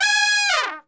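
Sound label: G#3 TRP SHF, Trumpet; Best Service; Brass Super Section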